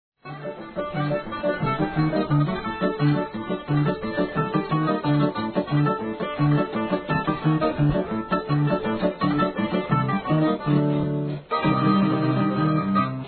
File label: bouzoukia; Nestorio Kastoria; pneymatiko kentro Nestoriou